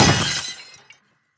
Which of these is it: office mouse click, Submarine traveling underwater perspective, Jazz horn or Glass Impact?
Glass Impact